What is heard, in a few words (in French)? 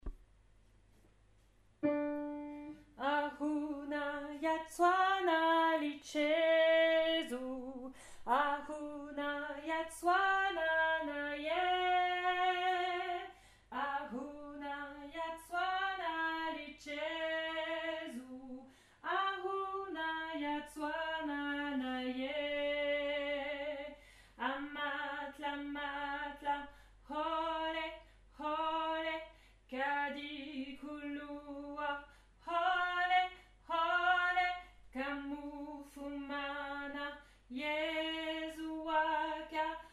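Mezzo, Choeur; Ahuna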